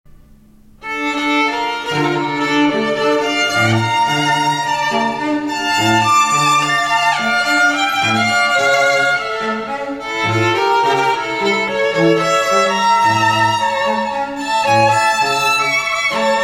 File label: Tango (String Trio); El Choclo; Cantilena South Florida